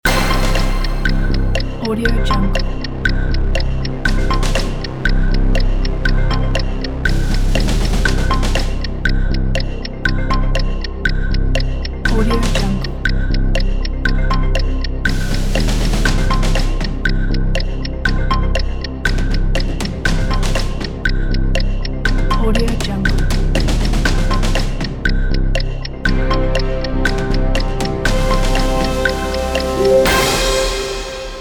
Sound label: game show timer